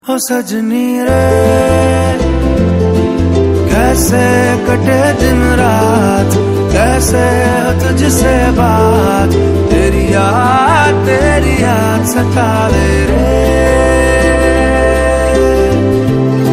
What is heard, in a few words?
Song Ringtone